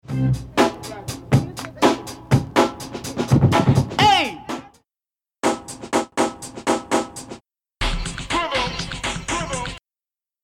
Break

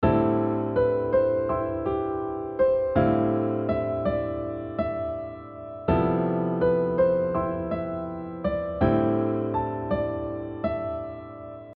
modal quartal; modal harmony

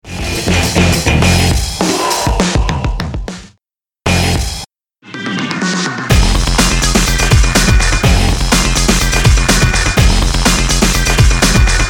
Guitar stab

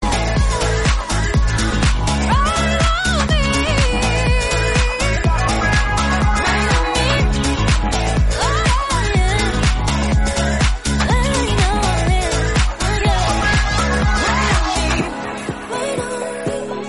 BGM

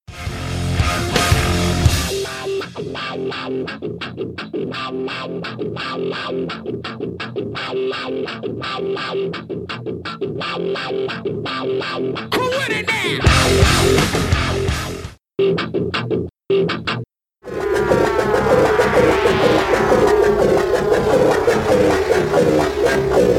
Wah-wah guitar loop; Titan

